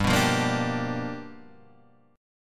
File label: G 7th Sharp 9th G chord, Guitar Drop D